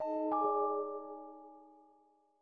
alien system beep

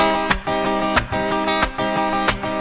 183 brazil guitar loop; SAMPLED MUSIC LOOPS

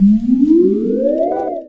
bloop bloop bend fx; Computery or Science Fiction

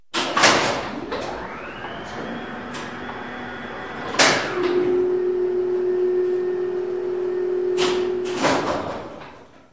freight elevator; Sound FX Real Life

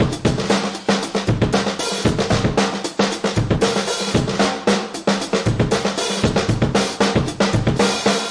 bouncing ball, 115 bpm, drum loops and breaks

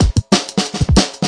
jack me in, DRUM LOOPS and BREAKS, 186 bpm

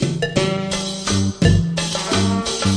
middle east blues, 086; MELODIC LOOPS; SAMPLED MUSIC LOOPS

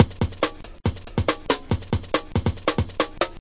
140 bpm, tinny groove, drum loops and breaks

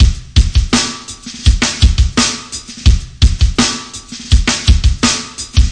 classic 2 loose, 084 bpm, drum loop

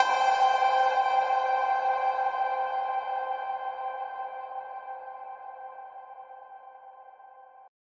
808 cowbell superverb

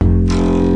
rattle acoustic bass note; Basses

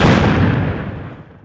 explosion

